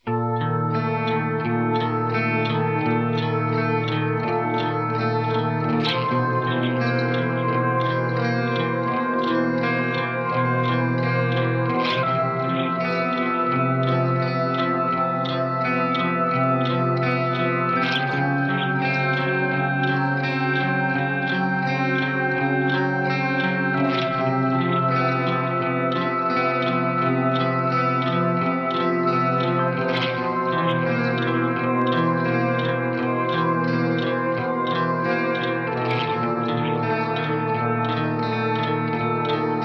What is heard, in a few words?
Sample guitar stereo